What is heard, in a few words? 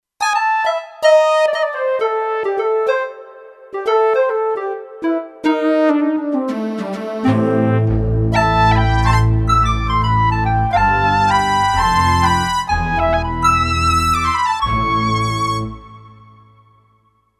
Three Leads, Kurzweil K2500X